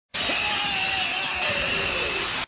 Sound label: yell fall; Rodimus